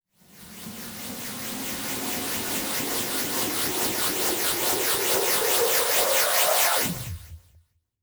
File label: Sweep, Fx, Sweeps, Trance; Zero-G Trance Inducer